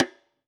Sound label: Pearl Piccolo Side Snr Off, drum